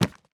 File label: Chiseled bookshelf pickup; java 1.16.4